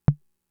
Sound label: Tom, Fricke MFB-522